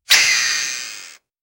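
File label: old school camera; human emotion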